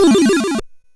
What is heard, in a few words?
Drums, Hits; DCM8